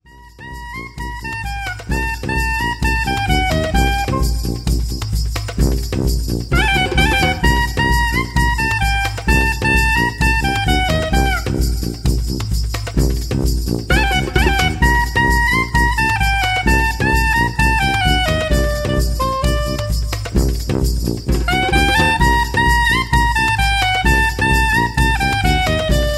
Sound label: Arabian Waltz